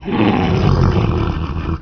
growl

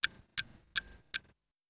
Clock loop; Rosegarden; russia

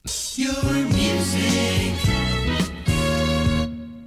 topformat maxi jingle single

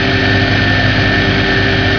ENGINE

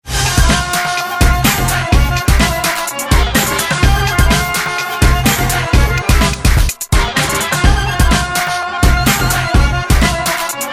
turkish; Rosegarden

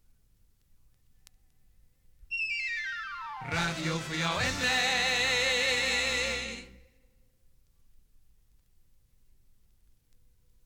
topformat maxi jingle single

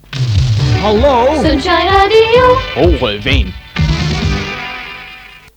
sun Shine Jingle; SunshineJingles

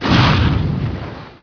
impact snow

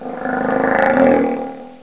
GROWL, Dieren; sound effect

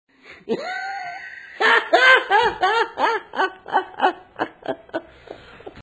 HAHAHHAHA; Haloween; Rosegarden

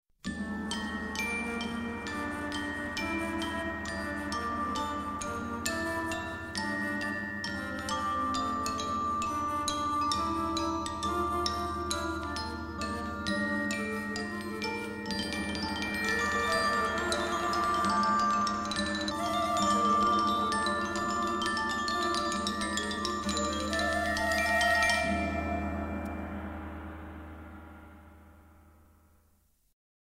Balinese Gamelan; Media Music Ltd; Short Cuts From Around The World

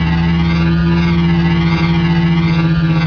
maw fast loop

